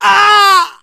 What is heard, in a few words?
hurt major, survivor voice, manager